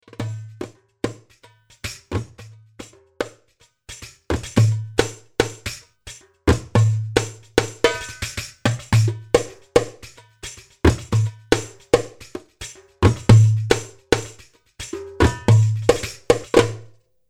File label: darbuka